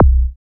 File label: BD, Dance Drums